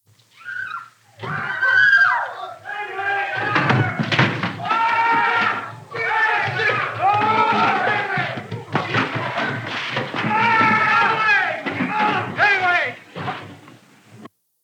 Medium Distance Panic Crowd Screams As It Escapes Danger Interior